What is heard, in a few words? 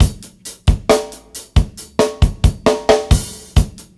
DRUMLOOP, Ragga & Dub Reggae